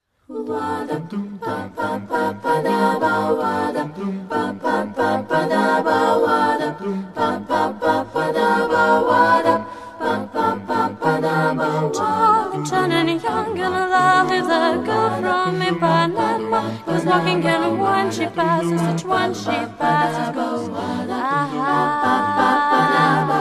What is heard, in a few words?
vocal music